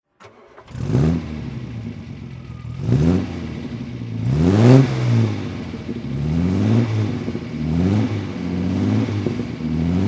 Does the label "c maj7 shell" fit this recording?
No